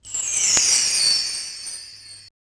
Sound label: HIT